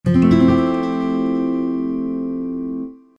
Major 9th Message, Six String

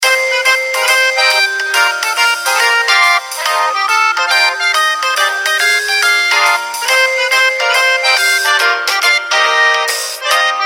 Humorous; ZTE; Majesty

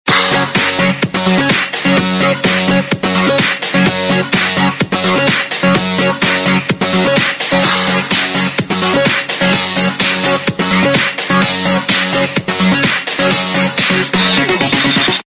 Feel the beat; Samsung GT-B3410

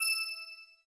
new particle unlock; LG V60 ThinQ

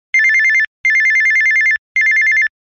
Single Tone; Samsung; SGH-A927 Flight 2

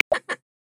Silver Pheasant; Xiaomi Redmi-Note-8-Pro; South West Mountain

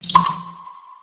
Klock; Alcatel Elle GlamPhone N1

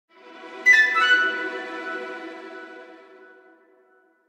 Orchestral email alert

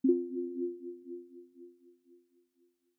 Fading Ring

